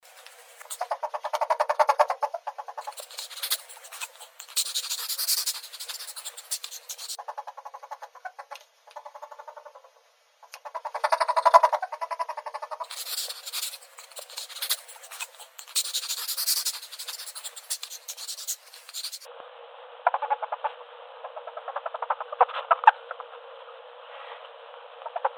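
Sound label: forest polecat, Mustela putorius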